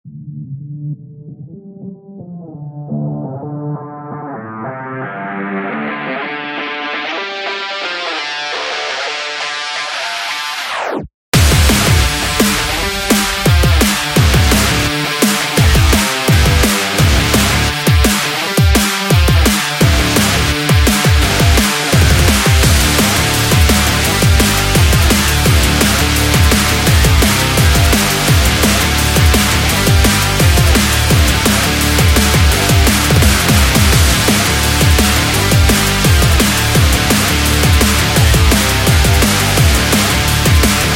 Drum and Bass Rock

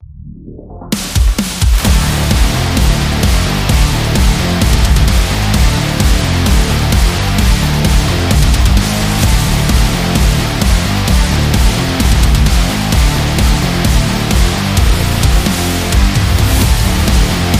Industrial

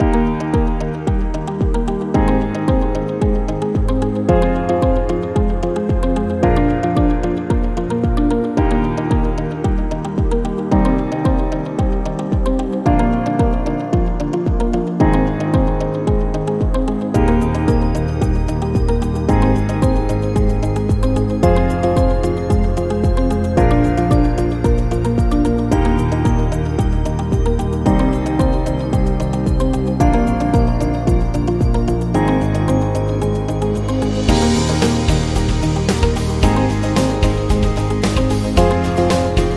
Presentation Commercial Background

